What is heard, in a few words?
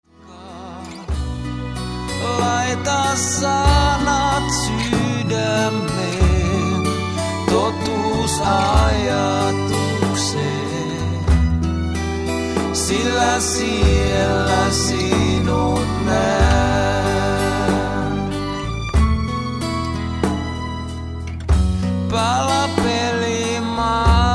unplugged